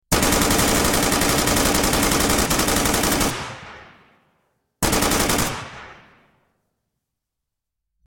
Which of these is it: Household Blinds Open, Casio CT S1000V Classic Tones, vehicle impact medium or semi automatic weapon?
semi automatic weapon